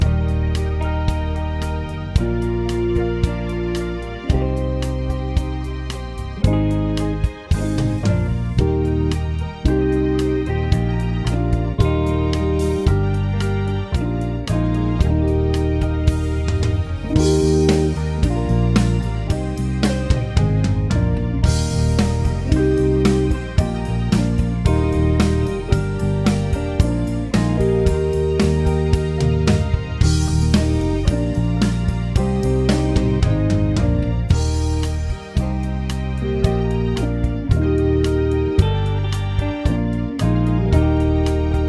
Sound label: Eb C Major Arpeggio, brass